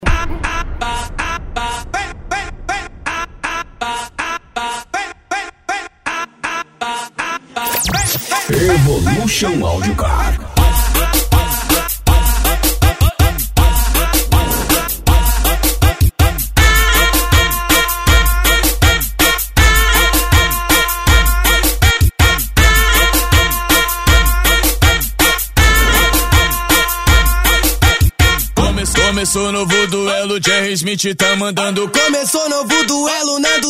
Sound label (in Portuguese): funk evolution audio car